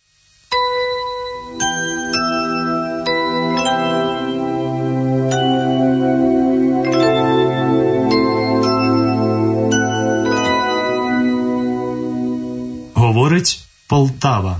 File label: interval signal; ur poltava ltava